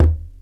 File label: OHDAIK; Crypton - DrumBase5000; JAPANESE PER